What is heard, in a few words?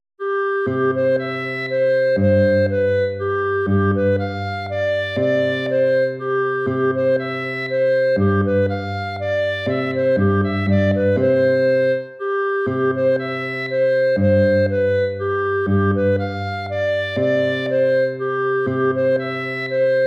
waltz; Carulli Ferdinando